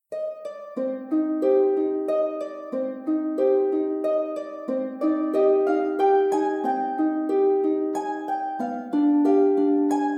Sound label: Little Harp Pieces